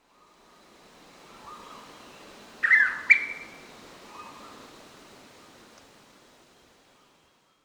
blue pitta, پرنده پیتای کبود

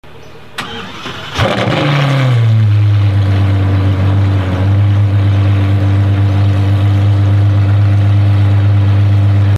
De Tomaso P72

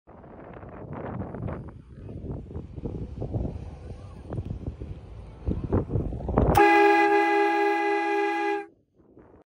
Italian double horn

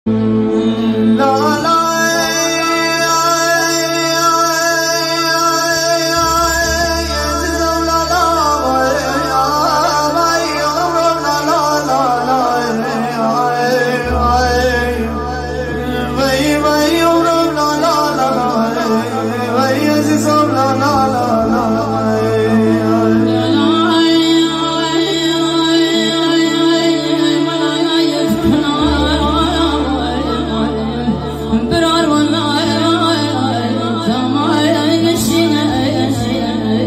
hooreh kordi; هوره کردی

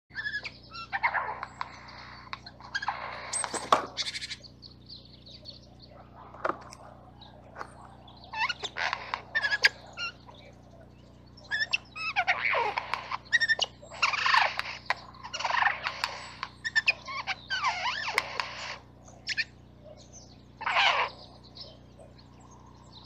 Eurasian jay; جیجاق

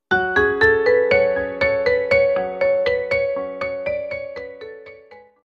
Turkmen ringtone

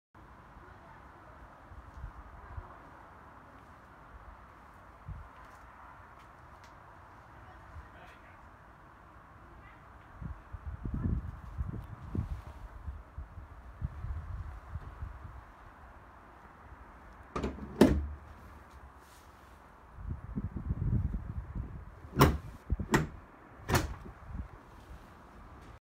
capot; کاپوت ماشین

